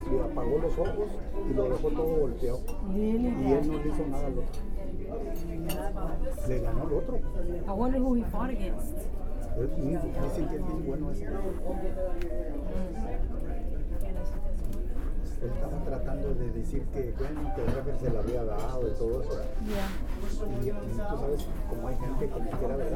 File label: restaurant amb